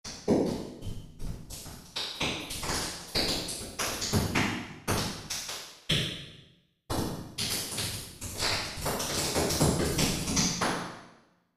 Small Room; lexiverb

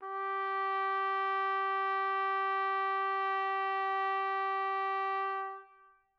trumpet; interpolator

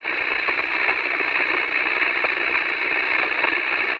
static

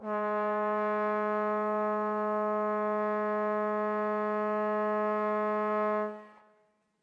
flute trombone; interpolator

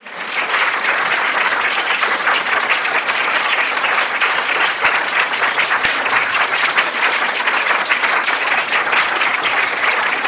applause synth

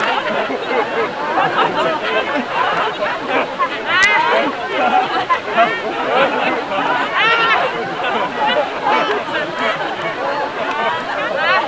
laughter

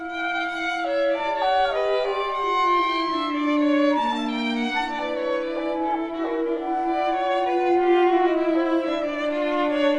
trio synth inst mix

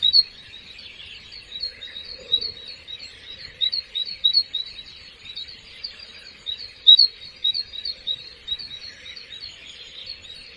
birds

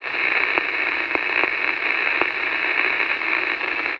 static

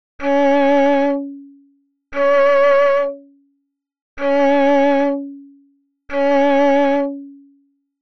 Vibrato

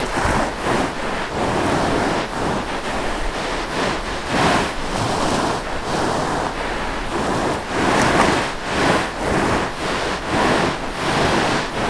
waves

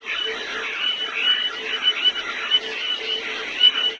birds

